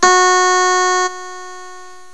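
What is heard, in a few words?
HARPSICHORD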